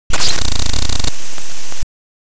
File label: glitch